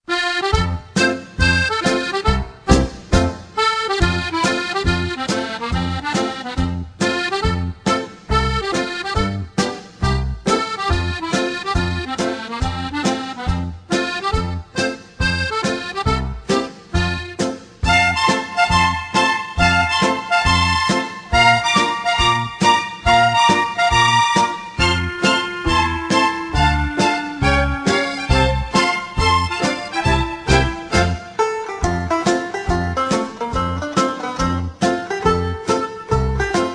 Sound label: bgm